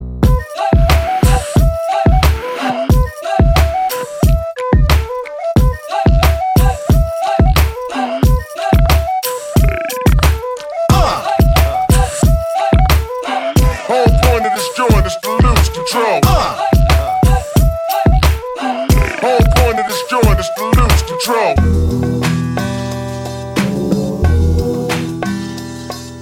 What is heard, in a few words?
Musical Ringtone